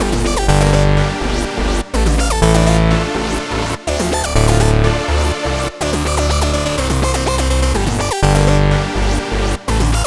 Full Mix, Dm, Complex Electro Synths; Invaders; Prime Loops

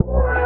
B STAB, funky

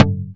Toms

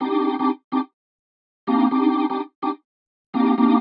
126 G C music, Funky Underground; Loopmasters; Funky Underground Vol 2

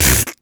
Human Beatbox, Beatbox Hits

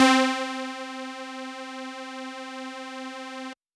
Dirty Dutch Lead C3; Vengeance Electroshock Vol.2; VES2 Dirty Dutch Special; VES2 Dirty Dutch Leads

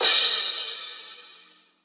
Crash, Cymbals; Mutekki Media; Vengeance Electroshock Vol.2

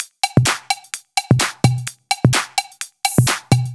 Loop, 128 BPM

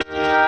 Eb STAB, funky

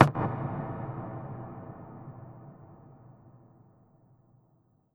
FX Impact

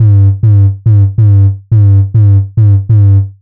140 F BASSLINE, Dubstep; Loopmasters; The New Sound Of Dubstep; BASS LOOPS

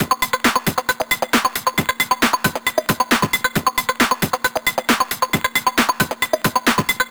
135 DRUMLOOP; Loopmasters; Booty Breaks Vol.2

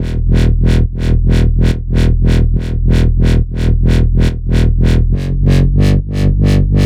140 E BASSLINE, Dubstep

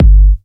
Bassdrum

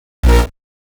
125 G Bass, Complex Electro Synths; Prime Loops; Explorations

